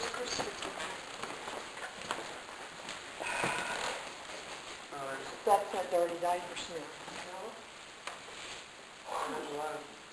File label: breath; evps